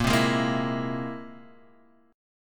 C Augmented 7th Bb

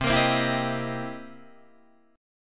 C 7th Sharp 9th, Guitar, Open D

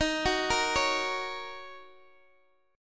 Eb Minor 7th Eb Guitar Open D

